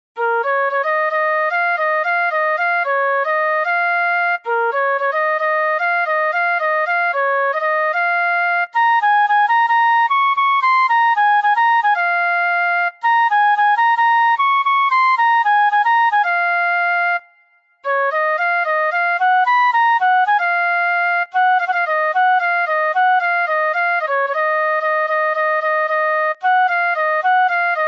Flute

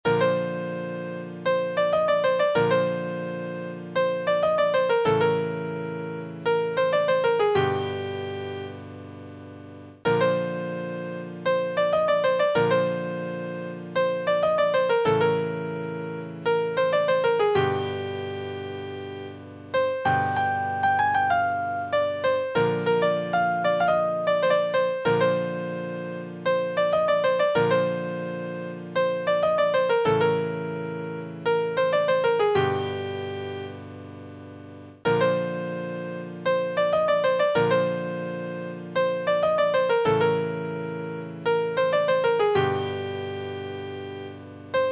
Piano

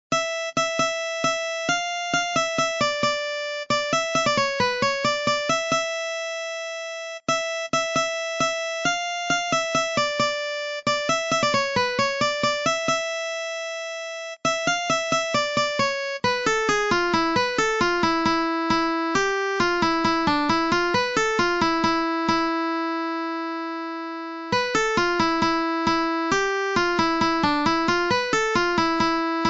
Guitar

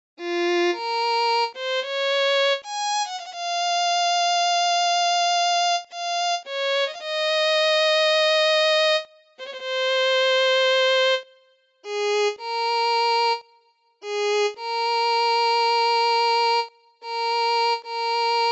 Violin

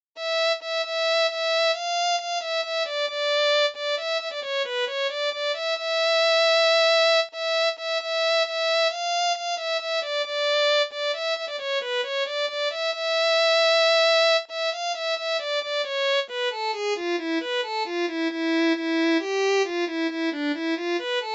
Violin

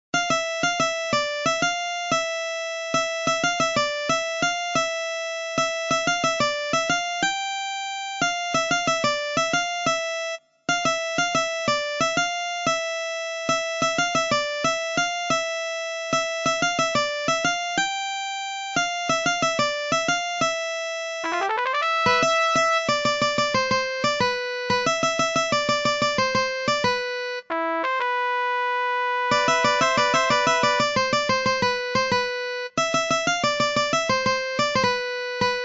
Guitar

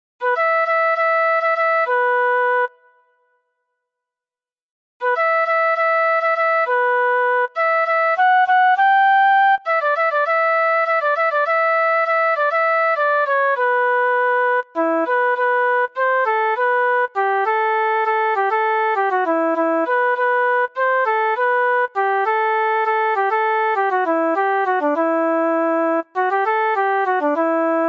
Flute

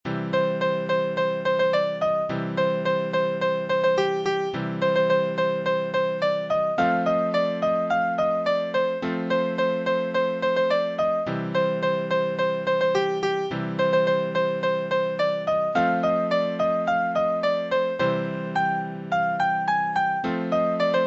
Piano

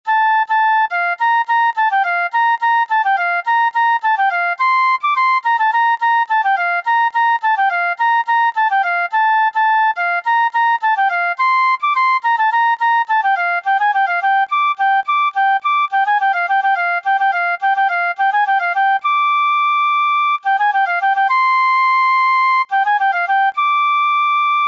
Flute

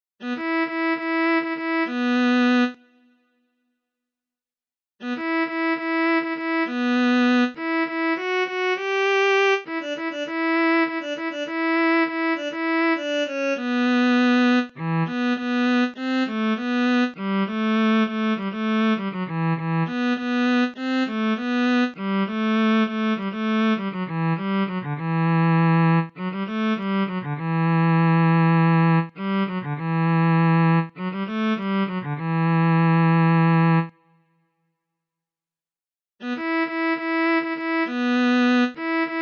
Viola

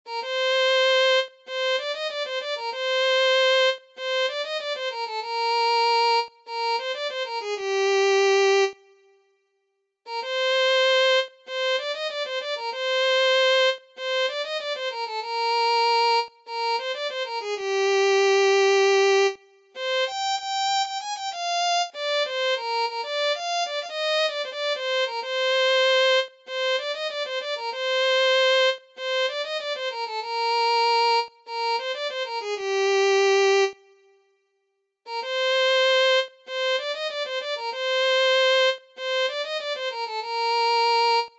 Violin

